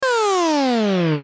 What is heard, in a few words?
burst descend; Buttons; Multimedia